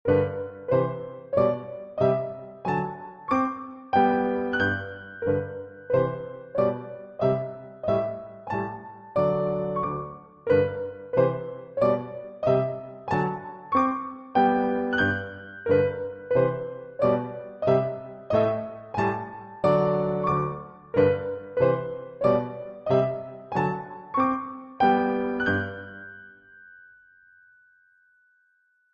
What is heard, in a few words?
Accompaniment Track